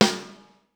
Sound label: lo pic rm; sr16 sd